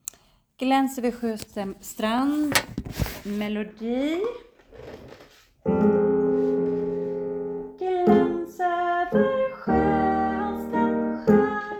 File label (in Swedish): folklig bar o sop, seniorkor; korsang